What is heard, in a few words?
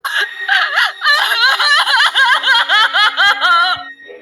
crying